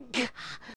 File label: Choke; Adult Link; used when Link dies